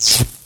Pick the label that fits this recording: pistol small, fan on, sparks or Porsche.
sparks